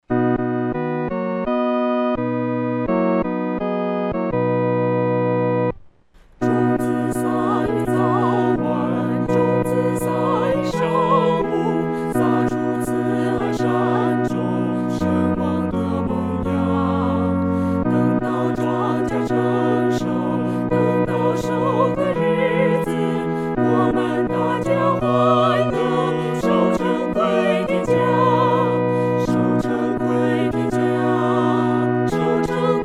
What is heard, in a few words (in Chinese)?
合唱（四声部）